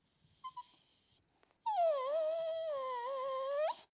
dog whimper sad nerved

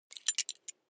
metal belt buckle clatter heavy

